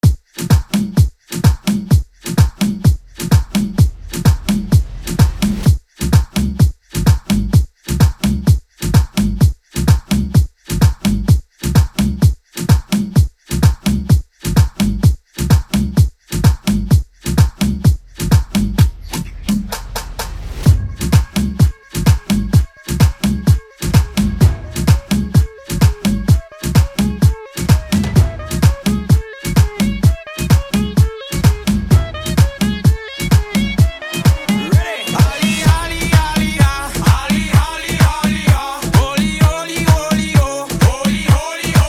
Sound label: Tribal House